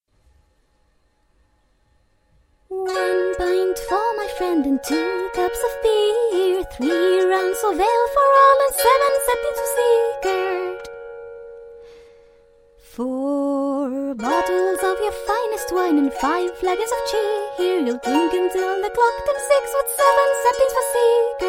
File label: lute